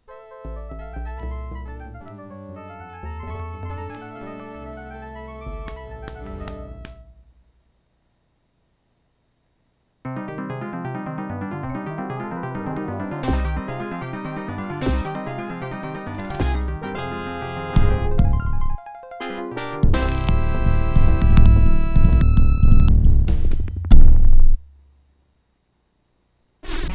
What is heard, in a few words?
Intro SB CT1600